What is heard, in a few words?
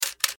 camera